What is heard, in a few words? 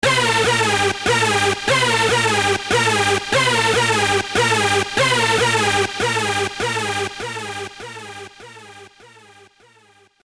hoover